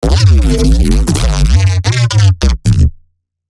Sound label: neuro thing, bass